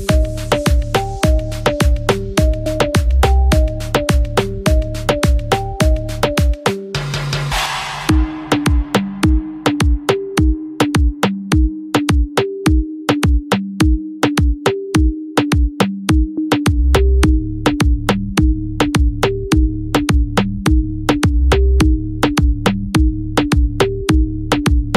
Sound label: English Beat Ringtone